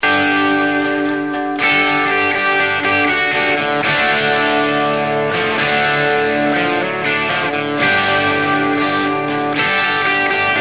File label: 8bit mono